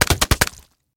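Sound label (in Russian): точное пулеметное попадание в цель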